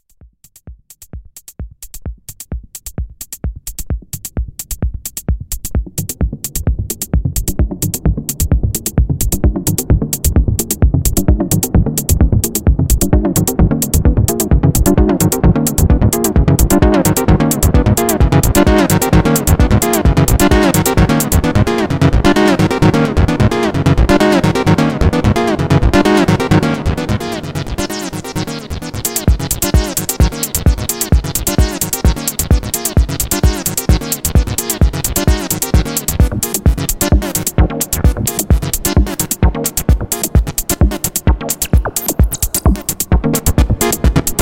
pulse multi osc

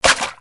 quik crunch

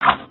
tee swing

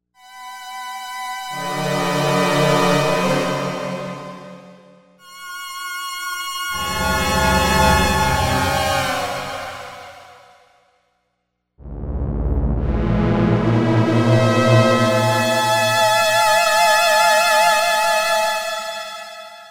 Andromeda Glimmer Pad

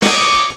weather report hit

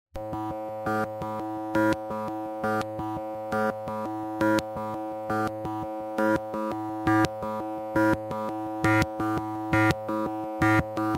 Sine A137, doepfer a100; PlanB

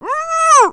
muffled yell

